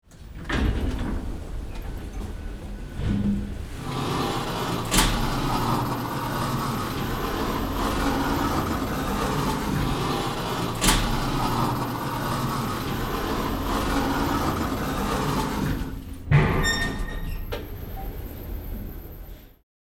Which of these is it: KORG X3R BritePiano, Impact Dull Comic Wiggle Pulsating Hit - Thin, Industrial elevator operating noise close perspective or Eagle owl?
Industrial elevator operating noise close perspective